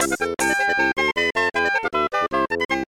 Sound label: Game Over; MW